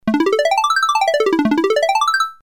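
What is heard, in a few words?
arp up down; nord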